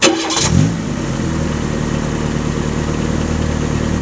START-IDLE, CARS

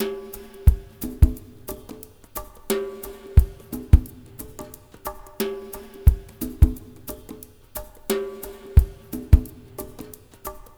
MIX, SWINGTOMS; Spectrasonics; Liquid Grooves 1 & 2

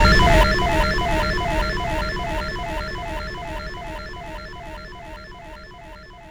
FX, Futurist Drum & Bass

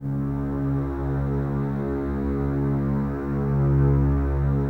Basses C#2, String Ensembles; Miroslav Vitous; 90 s Sample CDs

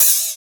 96 MOD OPEN; East West - Phat & Phunky CD2; 90's Sample CDs

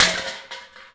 BOWL, INDUSTRIAL; Roland - LA Composer vol. 1

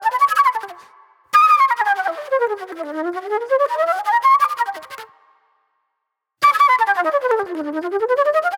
Raw Funk Flute

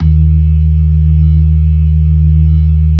LO MAN V R, ORGAN LOWER MANUAL; Keith Emerson Signature Series

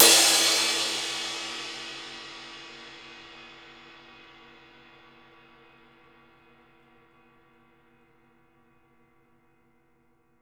DARK CRASH; Sampleheads; New York City Drumworks VOL-1; KD CRASHES

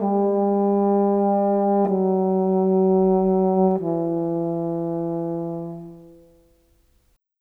Horn, Orchestral; Sonic Foundry (Sony Creative Software); Orchestral VOL-2

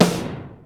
SNARE, DrumBase5000; Crypton; CFZ KIT 2

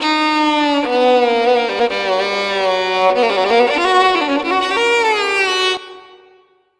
Violin; Sonic Foundry (Sony Creative Software); World Pop; Stringed Instruments

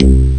chicago bass; Amiga Soundtracker Sample Packs

